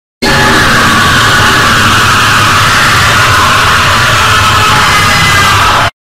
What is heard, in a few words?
really loud scream